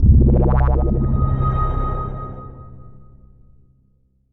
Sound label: Albino Space Hit C2